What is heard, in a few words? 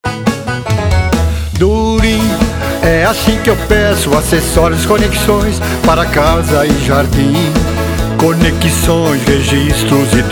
jingle country